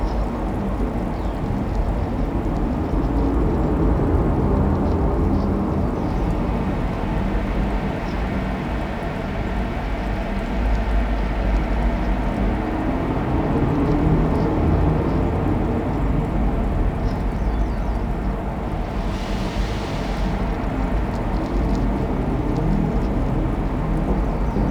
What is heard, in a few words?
Diorama Landscape Terra Loop; Warframe; Duviri; Dioramas